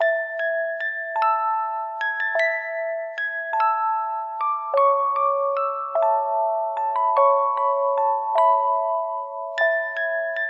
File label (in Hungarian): Fehérzaj; relax compact hang es dallamterapias feher zaj keszulek